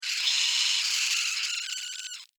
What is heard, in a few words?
Insect Voice Cry, Insect, Voice, Cry, Pain, Emotion, Vocalization, Bug; Sound Effects:Animals:Insects; Blastwave